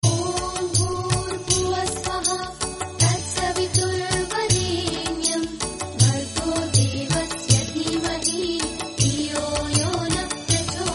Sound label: Gayatri Mantra Female Voice; ringtones